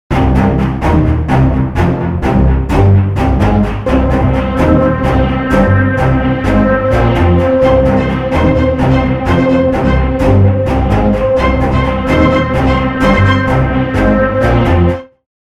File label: Straight Performance Combo